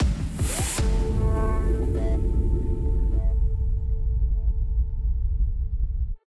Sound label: Transition; Everspace 2